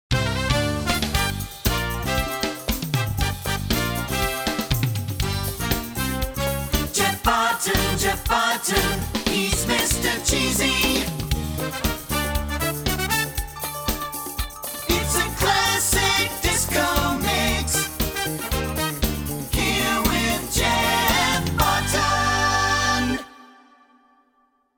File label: Jinglebells